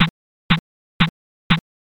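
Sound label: footsteps march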